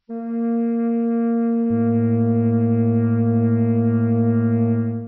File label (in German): flöte